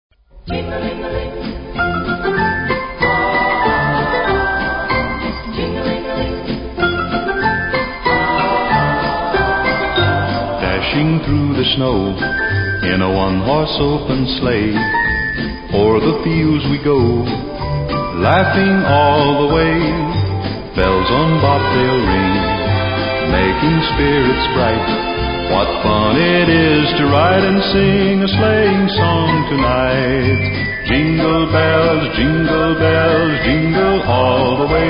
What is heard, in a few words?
Jingle Bells; Christmas